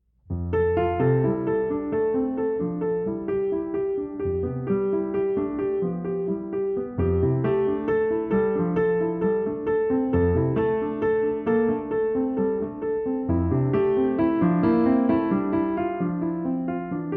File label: Improvisation